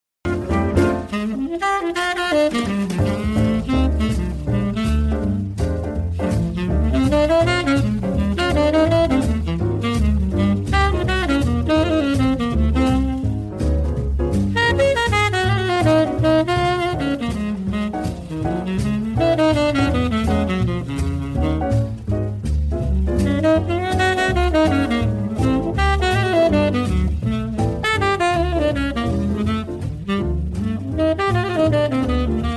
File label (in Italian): solo SaxT; Ear Training 2; Trascrizione 5